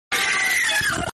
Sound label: boy screaming meme